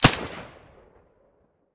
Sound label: silencer; S.T.A.L.K.E.R.; IWP; vityaz; pp1901